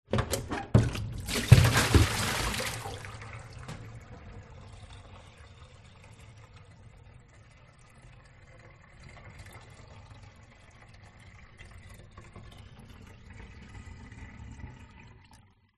household water tip from plastic bucket into sink drain